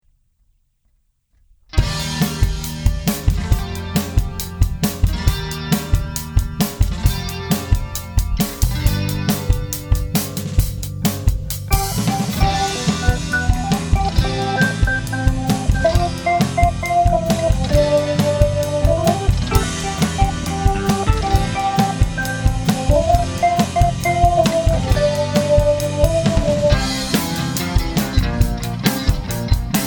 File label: instrumental